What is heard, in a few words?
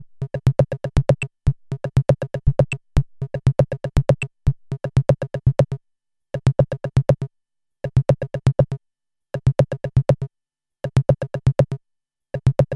random Rhythm; Dice